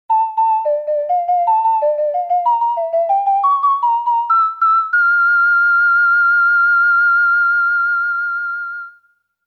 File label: Ocarina; legend of zelda the majora s mask 3d original sound track